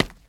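single short ringing sound of heels stomping on wooden floor; odinarnij korotnij zvonkij zvuk topota kablukov po polu derevyannomu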